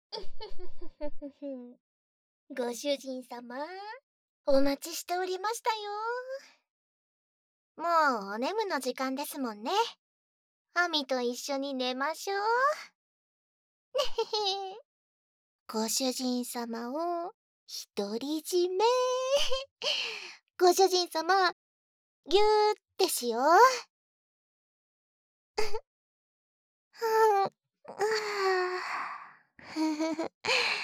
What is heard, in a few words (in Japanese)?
二人のメイドとおやすみなさい(Ｗ心音 添い寝)